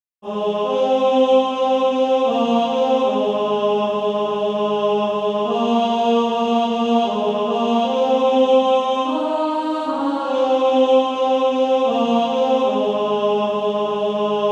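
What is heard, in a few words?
Male Choir, tenor 2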